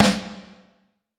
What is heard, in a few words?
snare single shot